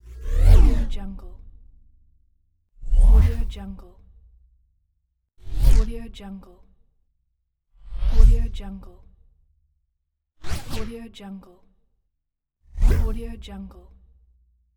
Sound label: technological whoosh pack